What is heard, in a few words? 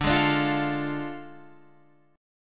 A Major 7th Suspended 4th Sharp 5th